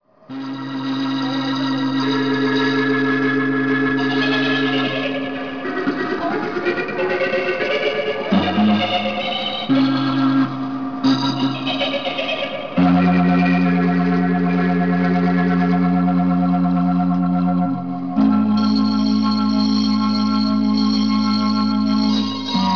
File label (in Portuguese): orgao